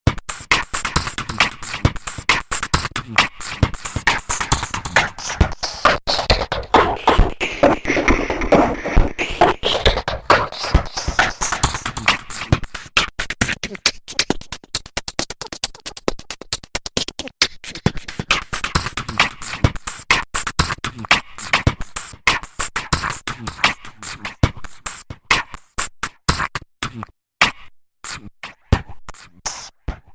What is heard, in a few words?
Slice Loop pitch tempo